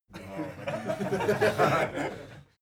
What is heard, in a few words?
laugh male